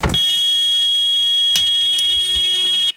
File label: continuous seat belt buzzer ending with click; vehicles